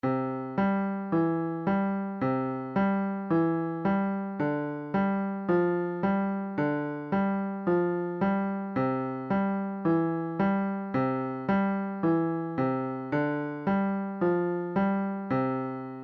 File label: floaing left hand, piano